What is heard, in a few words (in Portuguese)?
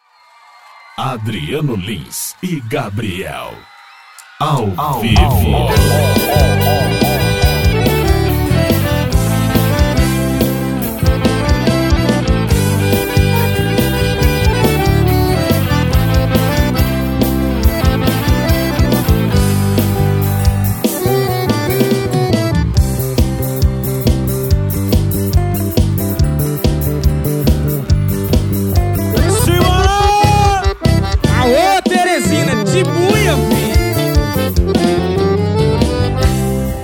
abertura